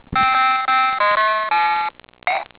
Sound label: Ending